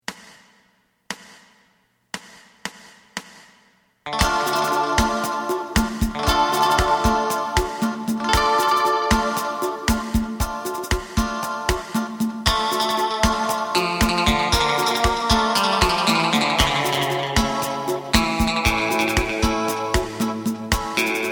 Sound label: bass